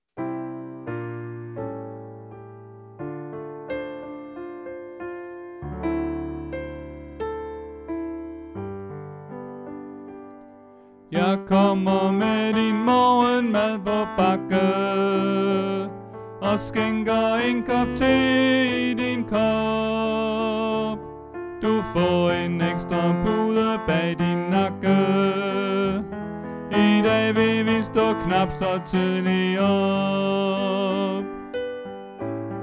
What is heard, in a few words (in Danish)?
Tenor